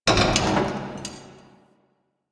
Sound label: Car Creak; Warframe; Ambience; NineteenNinetyNine